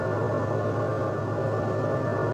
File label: wood chipper; SFX effect